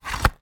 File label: medieval seax place in sheath fast; still north media